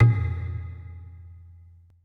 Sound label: E LOG #G, ETH DRUMS